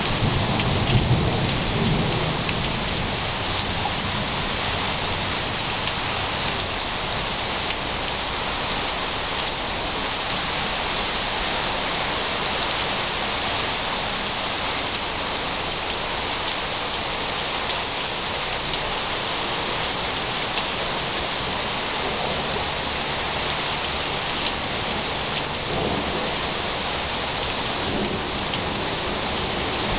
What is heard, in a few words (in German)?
Wolkenbruch; Sommer; O-Ton